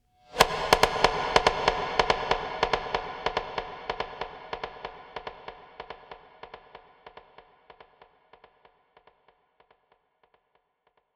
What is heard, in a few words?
Conga Bounce Movement 142 BPM; Drums & Percs; Percussion